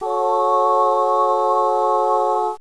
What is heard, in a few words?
CHORD